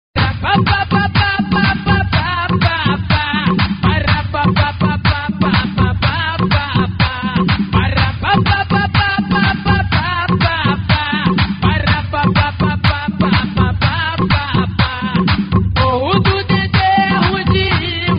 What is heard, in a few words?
funk; multitrax